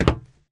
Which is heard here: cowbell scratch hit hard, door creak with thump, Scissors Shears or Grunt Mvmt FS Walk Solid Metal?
Grunt Mvmt FS Walk Solid Metal